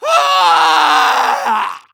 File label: medic pain crticial death; tf2